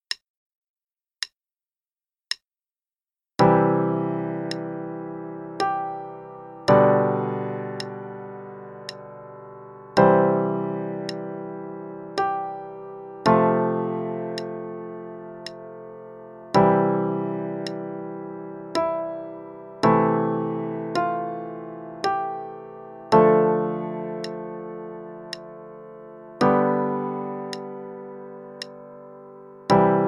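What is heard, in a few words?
Play-along, quarter note=56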